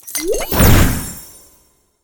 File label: Potions Mixing Alchemy